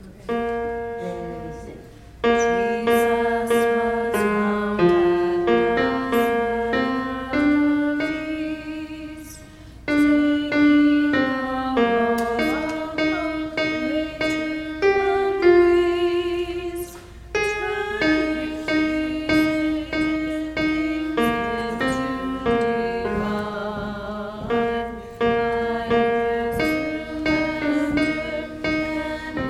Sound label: God in the Planning - alto; Gather Hymnal